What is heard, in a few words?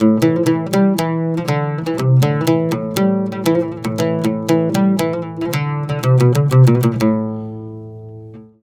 120 oud